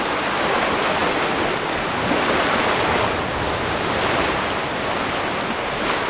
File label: Waves Ambient Loop; Totaled; XBOX